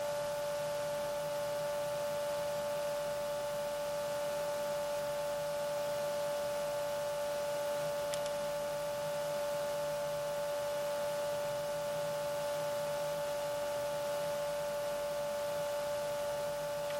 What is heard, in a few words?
pump100, aio; Ocypus Iota L36 360